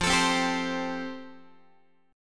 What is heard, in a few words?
F Major Add 9th, Guitar; Fadd9 strummed; JGuitar